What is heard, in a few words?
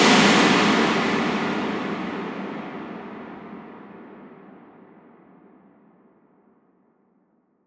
Noise Impact